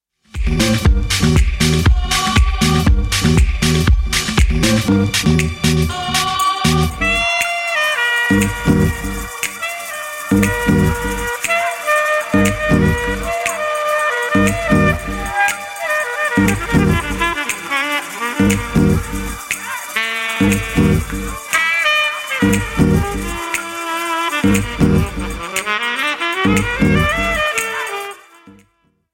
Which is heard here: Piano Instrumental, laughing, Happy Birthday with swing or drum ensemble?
drum ensemble